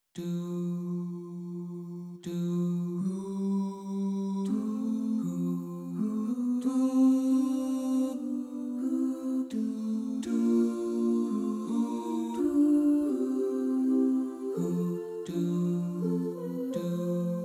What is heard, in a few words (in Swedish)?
Tenor; Agnus Dei